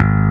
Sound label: bass